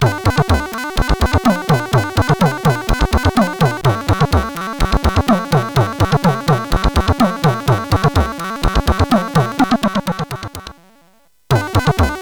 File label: Ingame Jingle, CSG8580R5